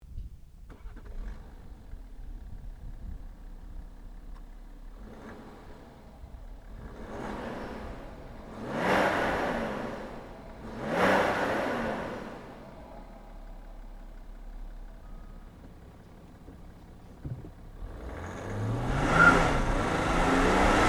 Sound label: BMW 535d Diesel